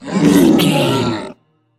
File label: troll monster death croak